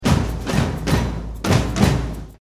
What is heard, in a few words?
clave